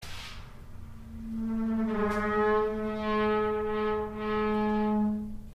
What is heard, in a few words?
shower door